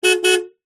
vehicles car hyundai trajet horn beep x2 external; 2006

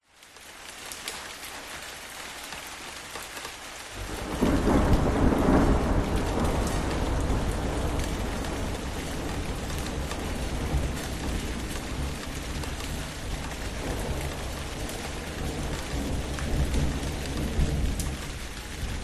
Rain Thunder Drippy Sharp; DPA4060

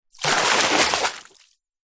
nature water pour medium amount deep sudden fast

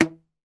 foley rubber band ping tight; zapsplat

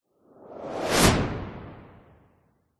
sound design fast whoosh; zapsplat

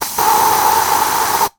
household womans antiperspirant aerosol can spray

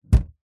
foley balloon inflated valve pull release thud

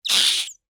cartoon squeeze squeak

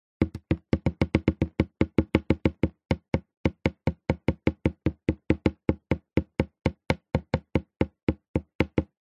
nature water drips thick cardboard

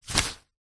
foley umbrella closed catch or set down; zapsplat

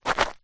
foley footstep single stoney path; zapsplat

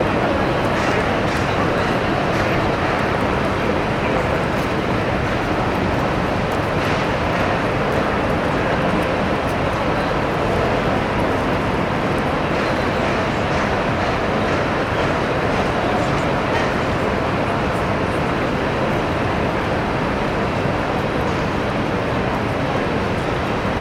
ambience urban city nyc broad street; sound effects one

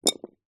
wood sticks skewers x2 drop carpet

